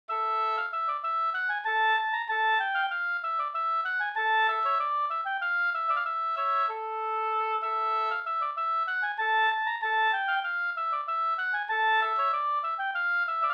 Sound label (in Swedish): halling